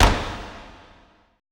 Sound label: E STEPS, ETH PERC